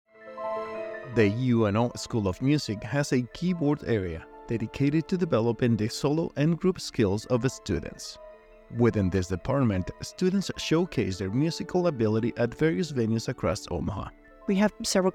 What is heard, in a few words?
Keyboard Area Recital